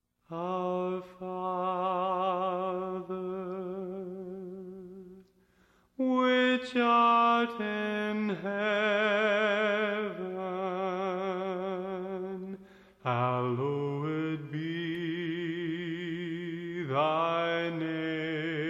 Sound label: adult concert choir; dallas christian